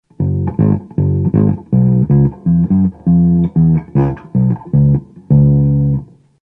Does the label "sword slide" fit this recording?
No